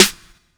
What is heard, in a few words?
Snares